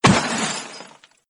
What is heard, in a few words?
crash